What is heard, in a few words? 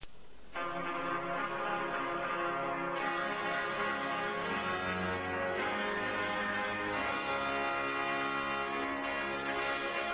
Trompetam